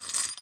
run gold; npc; courier; lockjaw

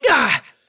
pain; davion; dota; dragon knight

